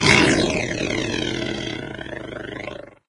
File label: death, doom3 pinky